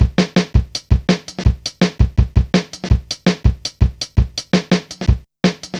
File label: Drum Loops, Jungle